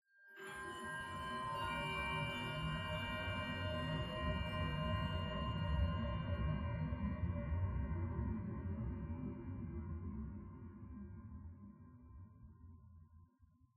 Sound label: Bells Interior